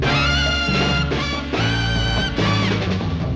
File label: riff